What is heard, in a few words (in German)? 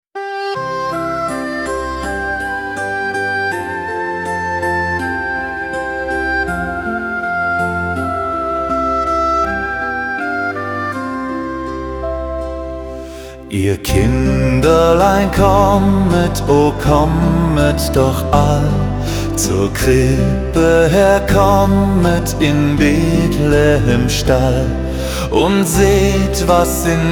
Ihr Kinderlein kommet; weihnachtslieder alt; weihnachtslieder gema frei